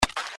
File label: G note